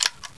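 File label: weapon move select; svencoop; sence; mistake coop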